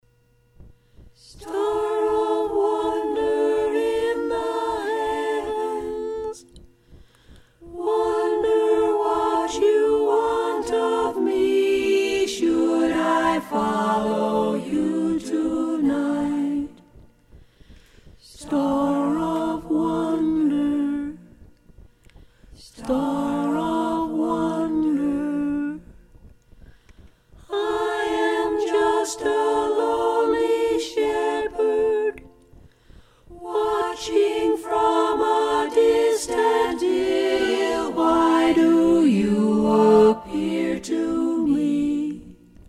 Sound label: Soprano; Choir Harmonix; Harmonies; Carols; Star Of Wonder